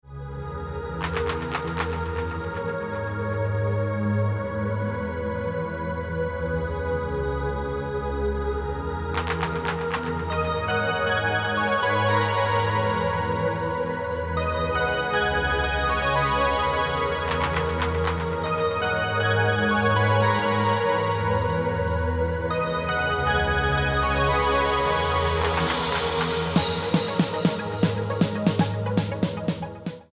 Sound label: Ringtone